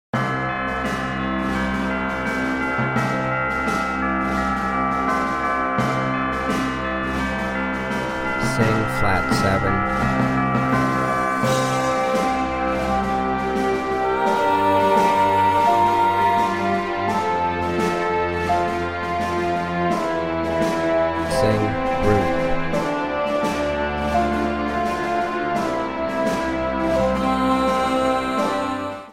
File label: Alto Key of C; applied ear training; Practice Perfect; Contemporary Orchestration Music Ear Training